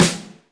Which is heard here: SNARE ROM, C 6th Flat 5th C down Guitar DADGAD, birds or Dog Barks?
SNARE ROM